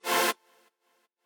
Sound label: chord